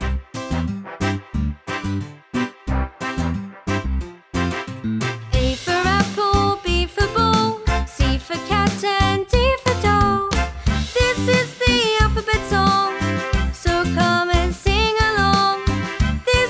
Abc Alphabet Song

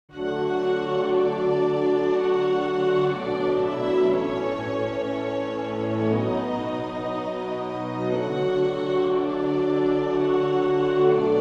Pop

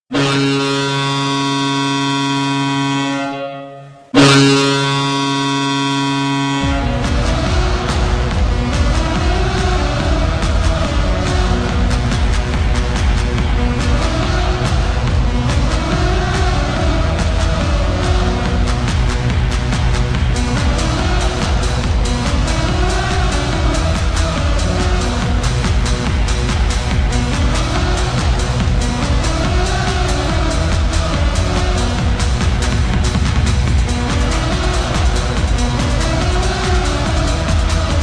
Goal Horn; Boston Bruins

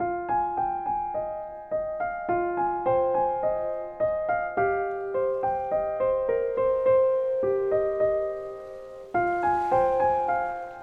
Instrumental Chilled Jazz, Relaxing Waves

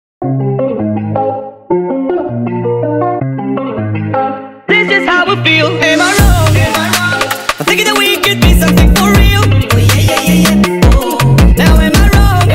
DRILL SPED UP

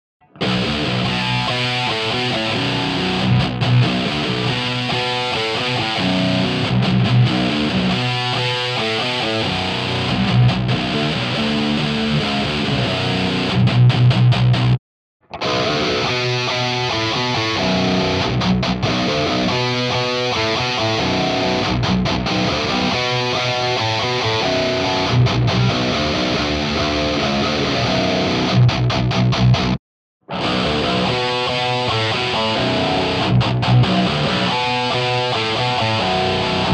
Ibanez RG 7420

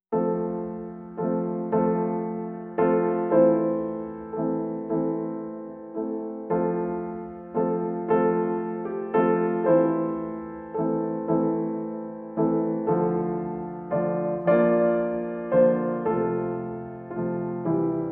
Chopin Mazurka, Piano

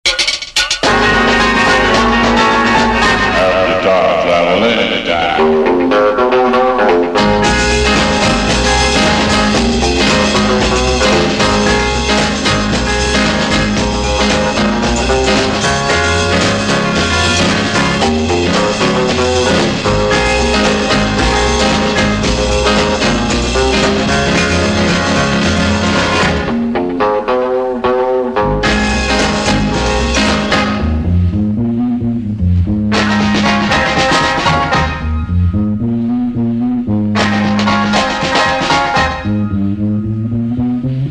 Guitar

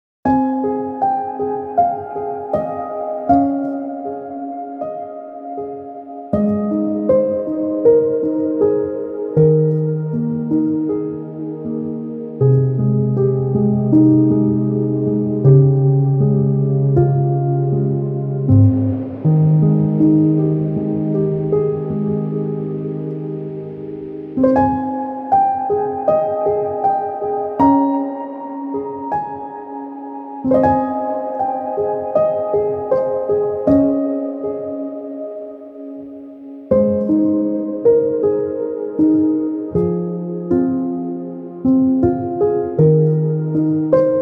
Rain for Deep Sleep

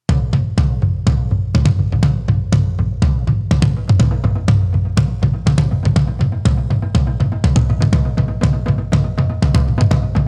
Taiko Drums

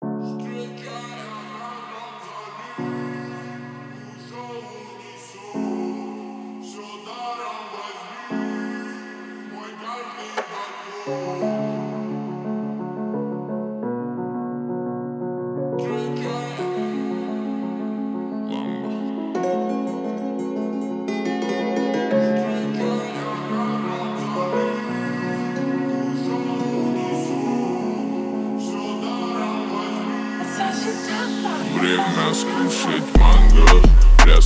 37.39.41hz Remix Low bass

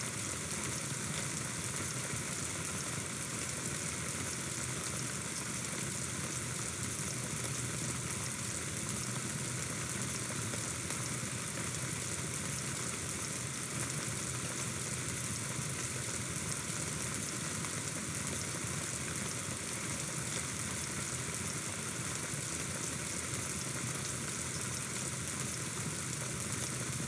Cricket Sounds at Dusk

